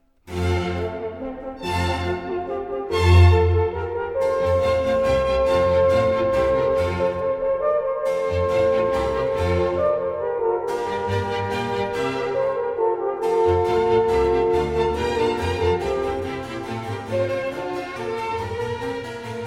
Concerto a due chori in F major, Allegro; HWV 333; Handel Masterworks; Klassika

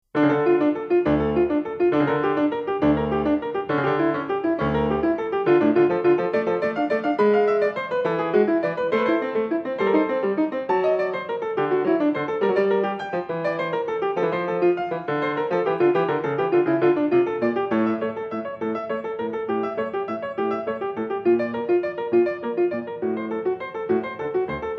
Prelude for keyboard in D minor; Bach, Johann Sebastian; BWV 926

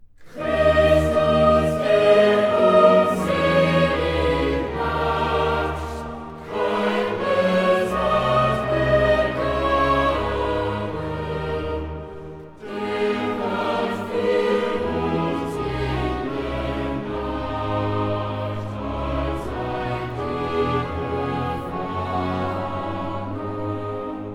Choral; Christus, der uns selig macht: Verhör und Geißelung; Klassika; Bach, Johann Sebastian; Choral Works; Johannes Passion BWV 245

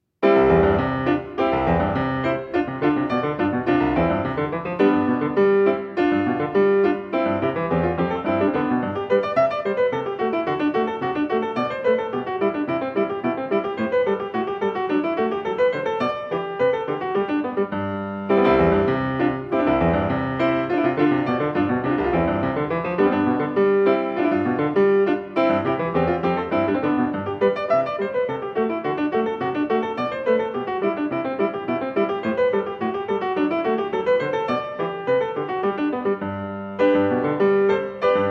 Prelude for keyboard in C major; Bach, Johann Sebastian; BWV 933

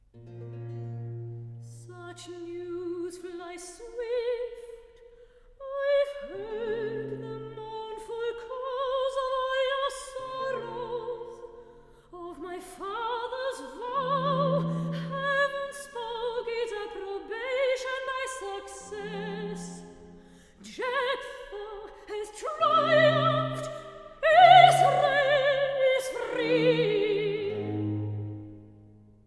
Recitative; (Iphis) Such news flies swift; Handel Masterworks; Klassika; Act 2

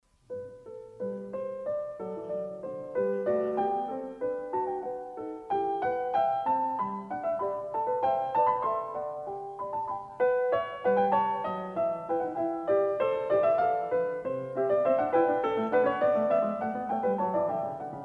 Bach Goldberg Variations; Klassika; Bach, Johann Sebastian

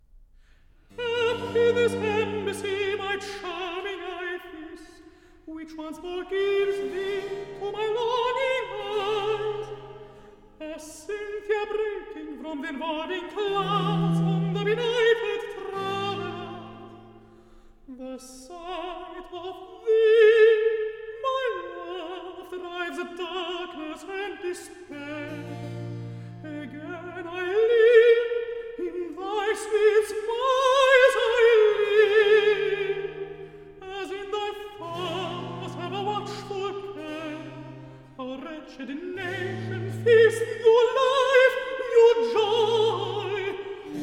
Recitative; Act I; Hamor; Happy this embassy; Klassika; Handel Masterworks

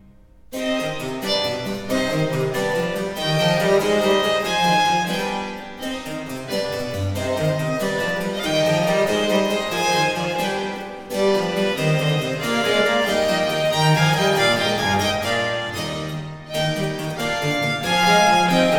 Sonate 8 in D minor Sarabanda, allegro; 3. Sarabanda, allegro; Arcangelo Corelli; Corelli - Complete Works; Klassika

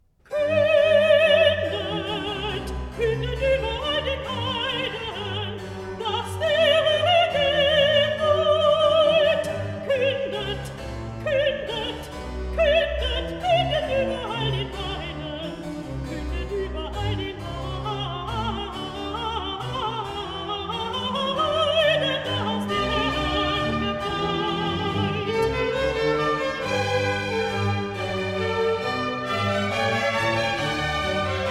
Soloists & choir; Act III; Kündet überall den Heiden; Handel Masterworks; Klassika

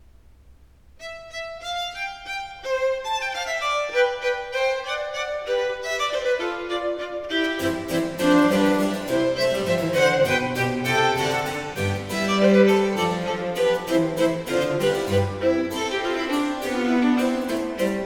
Sonate 1 in D major 5. Allegro; Arcangelo Corelli; Corelli - Complete Works; Klassika

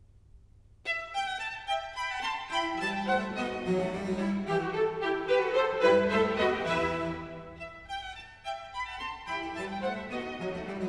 Concerto Grosso in E major, Allegro ma non troppo; Handel Masterworks; Klassika

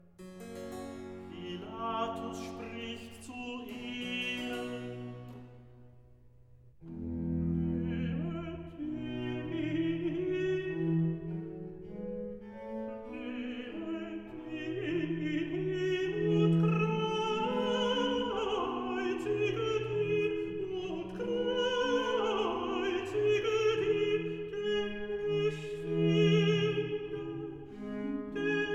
Recitativo, Arioso; evangelist Pilatus spricht zu ihnen; Pilatus Nehmet ihr ihn hin; Klassika; Handel Masterworks

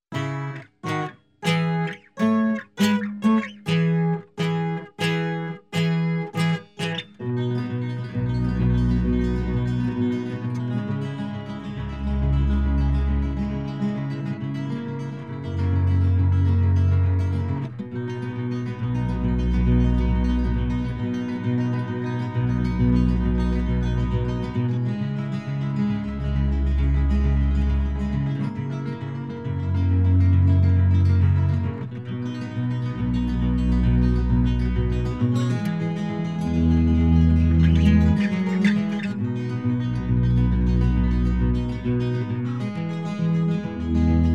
Instrumental

